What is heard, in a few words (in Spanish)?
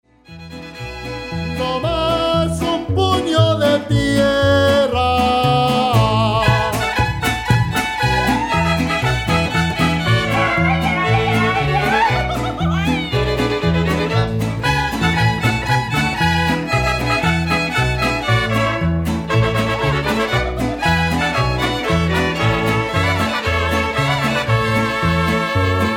mariachi